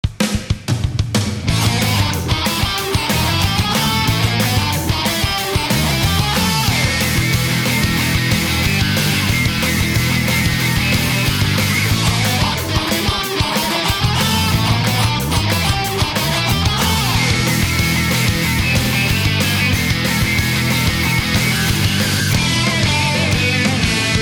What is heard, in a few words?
Zombie Rock; Forgotten Zombie Rock; Lollipop Chainsaw; PS3